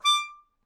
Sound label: Melodica Short; Short Notes Chromatic